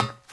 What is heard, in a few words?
ladder; starship trooper; humans; Nejlepší České Hry